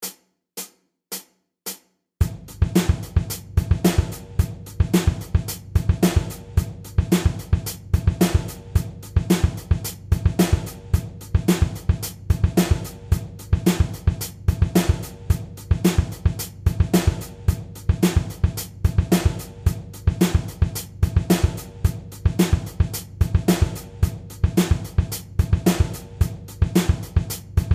Doubling Bass Drum

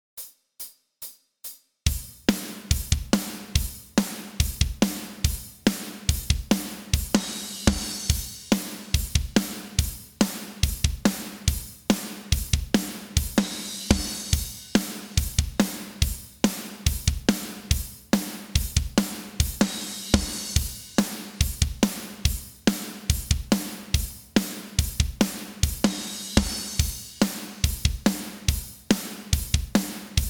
Verse 142bpm, bass

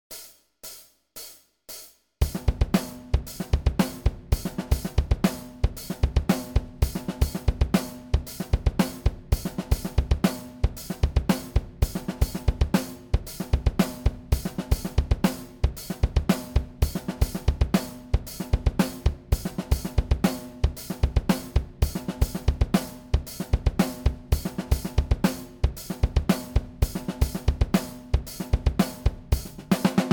19 16 Verse 114bpm, bass

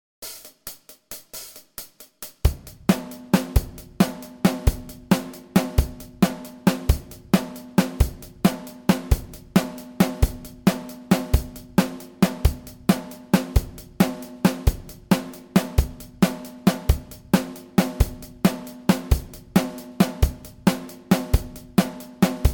5 8 time drums

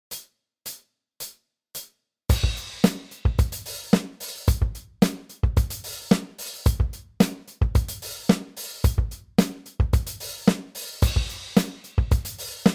Main Riff 110bpm

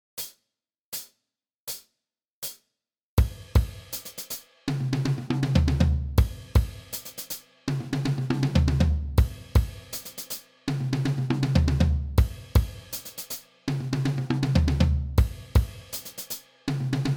kit

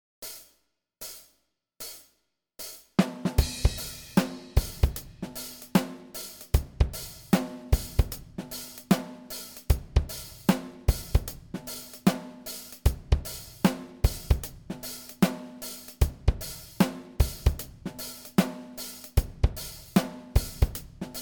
Pentatonic Riff 76bpm

